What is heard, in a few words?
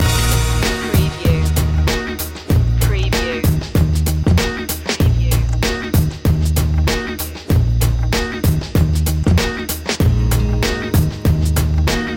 cool check loop